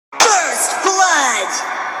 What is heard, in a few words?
kill